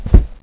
carpet; valve

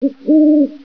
owl

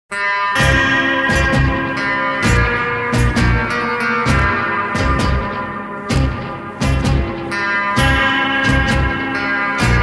western guitar; valve; dutch

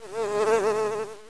Fly, insects; valve; ambient

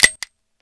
p226 slide back; weapons

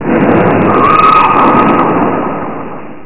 scream; valve; blackhawk

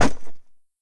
g bounce; weapons; valve

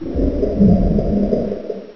bloater idle; valve

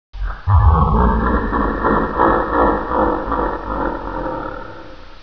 laugh

